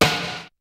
kicks snare, kid; Kid Nepro; Elite; KICKS SNARES

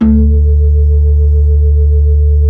B-3, Blues

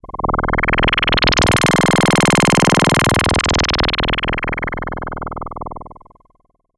SH-09 Tone Drop

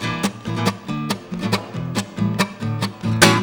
STL STRN BRK; Best Service; World Colours

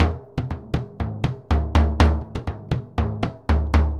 BOMBO, MERENGUE; Best Service ProSamples vol.14; AKAI

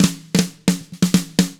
HRDRK, hard rock drums; ILIO; Double Platinum Drums 1

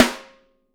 wood; Sampleheads; Peter Erskine Living Drums

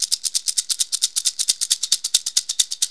SMALL SHAKER; DRUMS & PERC

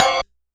Rhythm Hat + Ride Tail, Realistic Concertmate-670; Layered Kit

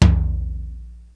drum, EMU Proteus; Proteus1

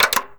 LEVER OFF; AKAI Anime Sound FX

